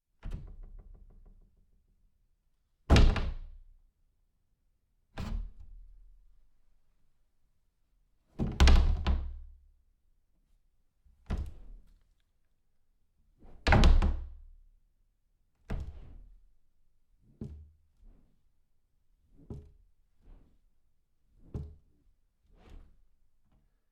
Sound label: door close